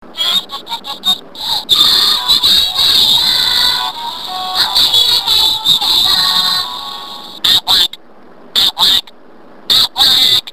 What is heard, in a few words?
awful duck